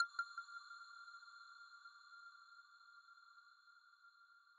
arp

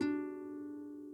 harp

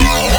custom bass

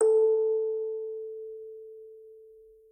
orgel

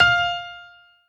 piano echo

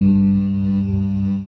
bass G3

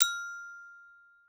gloken

